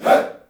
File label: "HEY", Heart of Africa, shout